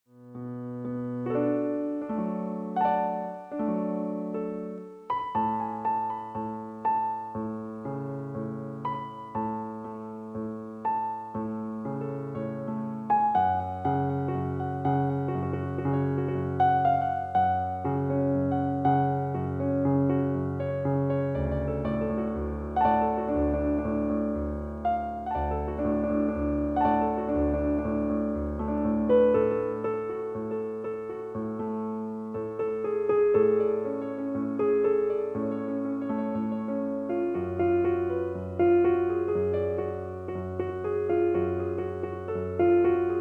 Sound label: Piano